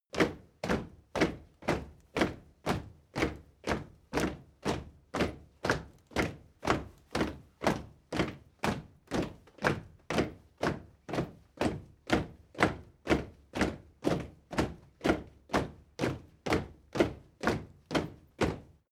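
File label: step crowd march loud sequence, footsteps; menschen; schritte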